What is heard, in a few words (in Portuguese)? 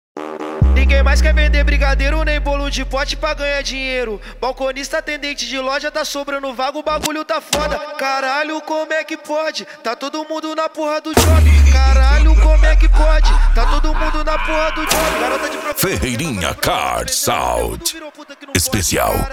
lual automotivo; ferreirinha car sound